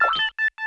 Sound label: radar